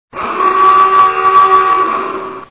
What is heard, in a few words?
aoogah; wow; Omen